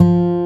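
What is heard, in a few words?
F 2 MARTIN, acoustic guitar; AKAI S6000 CD-ROM - Volume 1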